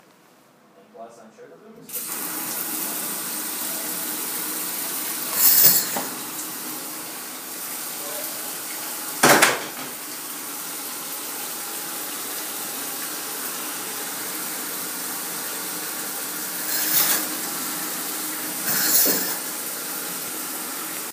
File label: taking a shower; Sugar loops at beginning